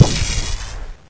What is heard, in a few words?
lightning, particle storm; hl2